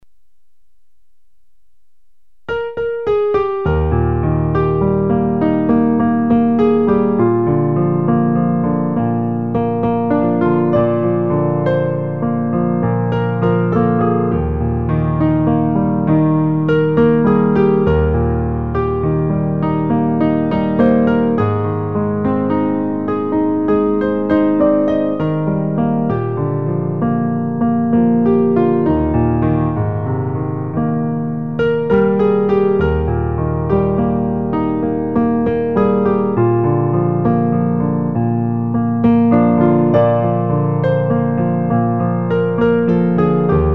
piano

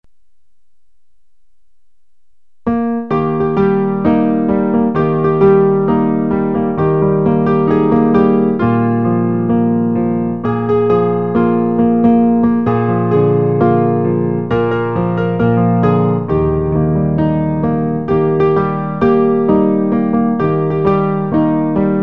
piano

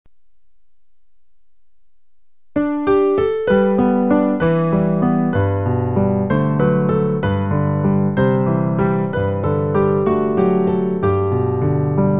piano

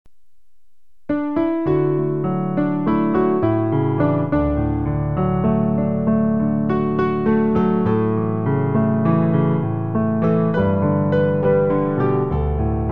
piano

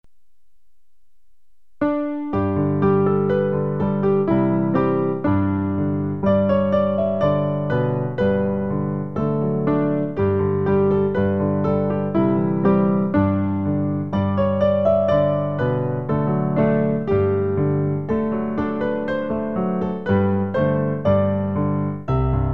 piano; S’il faut traverser le brûlant désert; Pour accompagner le recueil Louez Dieu.

